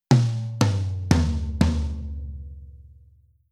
Rhythm Rock Tom

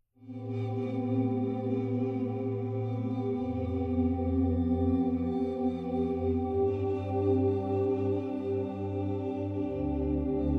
FutureBass Rough Arr

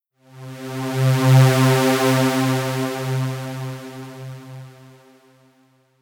Synth Env Pad

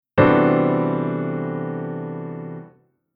Chord Poly

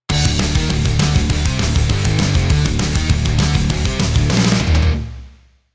guitar pattern

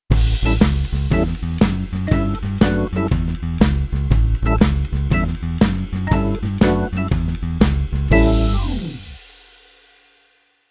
Keyboard Organ Blues

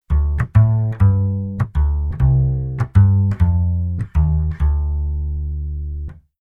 bass latin bass

